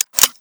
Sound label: clip out, weapons, fiveseven